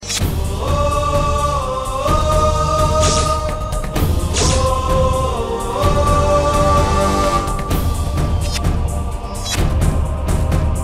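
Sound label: Is Calling R&B, ringtones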